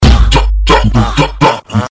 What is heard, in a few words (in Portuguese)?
base kit do dj